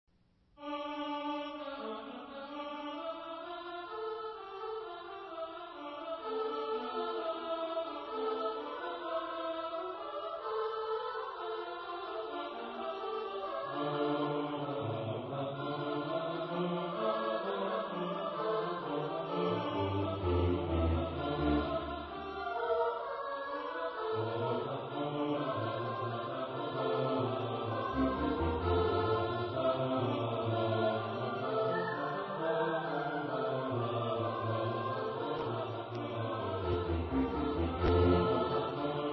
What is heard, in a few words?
Fugue in Dm; Baroque